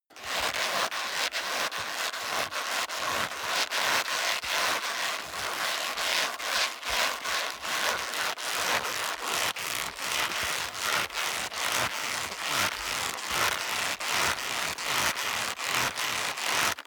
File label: leather creak sequence fast